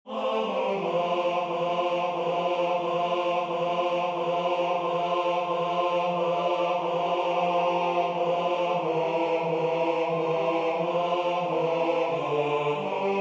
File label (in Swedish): Ej upplysta gårdar BAS